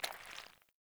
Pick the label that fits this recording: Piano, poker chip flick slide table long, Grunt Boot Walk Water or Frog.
Grunt Boot Walk Water